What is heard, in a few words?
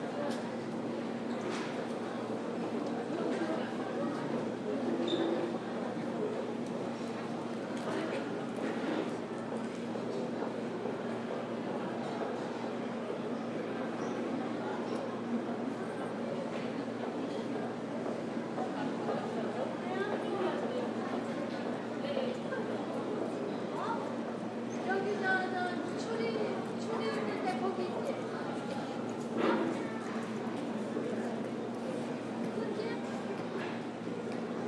Ambient sounds of Seoul Severance Hospital in Sinchon